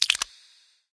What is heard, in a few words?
click; smite; chronos